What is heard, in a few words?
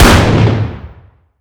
fire dist 50ae pistol int; gmod; arccw uc commons attachments